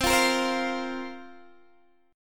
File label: C 7th Suspended 4th, Guitar, Drop D